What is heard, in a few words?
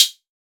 Closed Hihats; Cymbals; Vengeance Samples